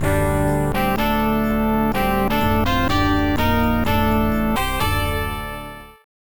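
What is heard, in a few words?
battle win; Castles II Siege Conquest; CD32; Amiga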